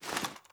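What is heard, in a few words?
weapon assault rifle aim down sights down; gmod; viper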